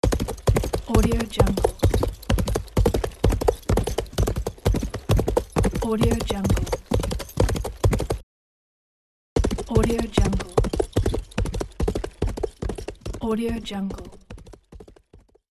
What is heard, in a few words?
horse gallop